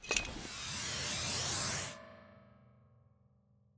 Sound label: Health Pack Pickup; Opsive; UltimateCharacterController